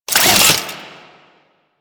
Necramech Repair Drone Idle Long; Enemies; Warframe

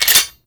Cannon Mech; Grineer

